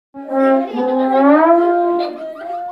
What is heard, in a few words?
Trombone